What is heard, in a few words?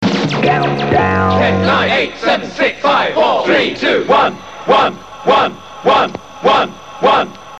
Countdown Jingles